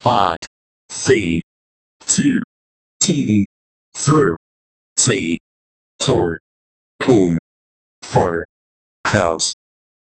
second countdown in, new year

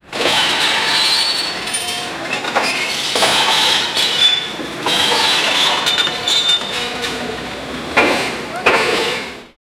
medieval building site pr, strikes

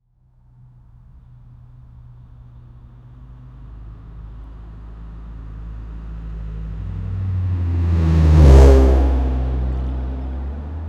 car noise

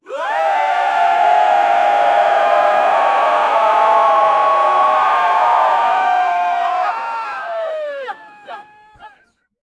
final crowd cheer louder; peak

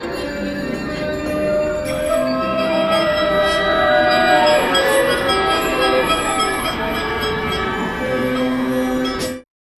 layers of sound chatter m; culture

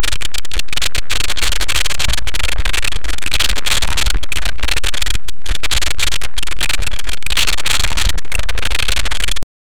army of heavy robots

